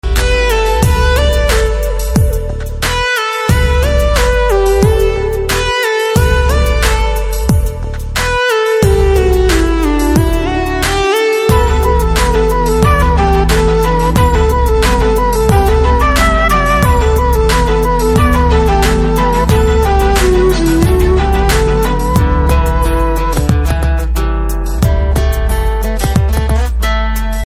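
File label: background Telugu Ringtone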